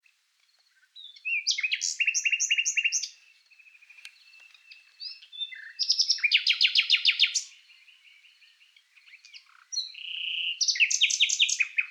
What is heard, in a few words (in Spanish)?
Ruiseñor común - Luscinia megarhynchos; ocells